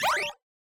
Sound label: Bleep Charge Up; SoundsCrate